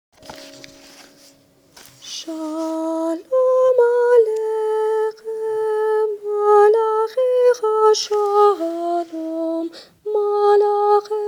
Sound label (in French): Shalom sopranes